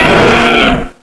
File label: small flinch; tubequeen